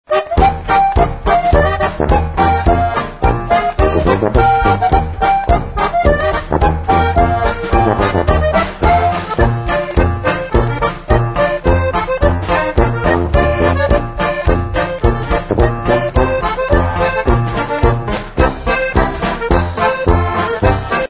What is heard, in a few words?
polka